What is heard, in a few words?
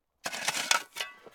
Rusty Shovel